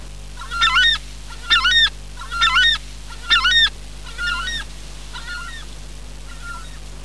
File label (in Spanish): Chaja